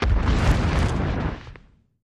Dragon Falls, dinosaurs

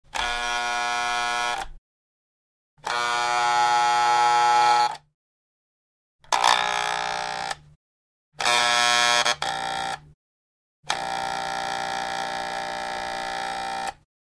Hair Dryer Broken, variable speed

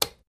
Light Switch Wall Switch; switches

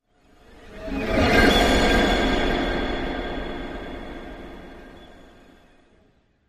Music Eerie High, pads

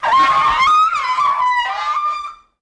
Elephant Squeal High

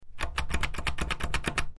Button Pressed Quick, switch clicks

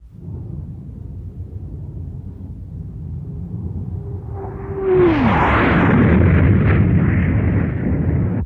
Starship Jet By

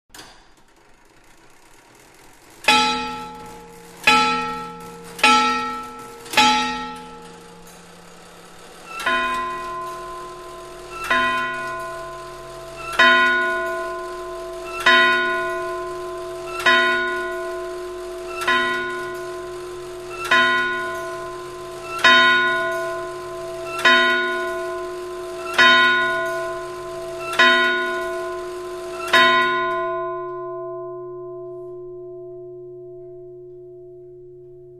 Clock Tower; bell towers

